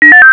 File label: disconnect tone earjack, phonetones Samsung; Samsung SCH-M490 T Omnia